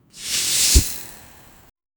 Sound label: ability usage, abilities